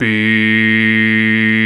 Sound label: VOX Male Scats; 90s Sample CDs; Club-50 - Foundations Roland